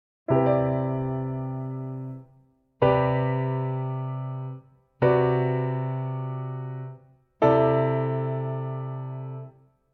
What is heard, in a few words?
voicing variation